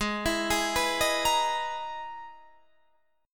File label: E-Major Sharp 11th Ab